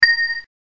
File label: selftimer